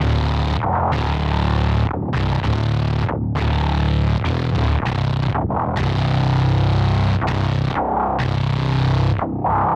Synth Bass; Bass X

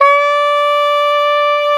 SAX D3, SAXOPHONE; USB Soundscan vol.63; Balkanish Traditions; AKAI

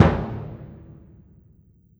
Bass Drum; Orchestral; Percussion One Shots; Sonic Foundry (Sony Creative Software); Orchestral Series VOL-4 - Rock and Pop

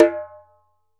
ASHIKO, African Perc

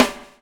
BUBBLE; Spectrasonics - Backbeat

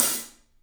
Paiste hat, drums; 3D Sound

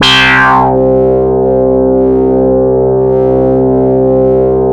Oscar bass; Big Fish Audio - First Call Vintage Keyboards; OSCARBASSICS

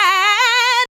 ADLIBS 90; Ueberschall; Jam Box

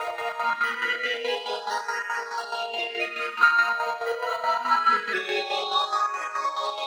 Lead Ghostbells, Drum N Bass, Keys Pads; Sonic Foundry (Sony Creative Software); Knutrix Drum & Bass

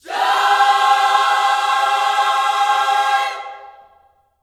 JOY C MAJ, Gospel, Vocal; ILIO; Vocal Planet

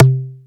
BASS FM, SYN BS; AKAI S6000 CD-ROM - Volume 1; SAMPLE WAVE MIXING 2; SYN BS #3

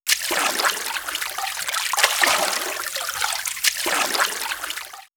Nature Small Waves; X-Static Goldmine

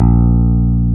A#2, E-Basses, Roland

